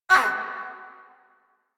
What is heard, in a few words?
Pain; Grineer Queens Guards; Warframe